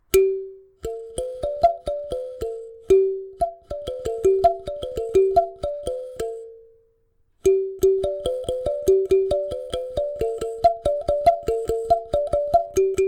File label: sanza 5 note sound sample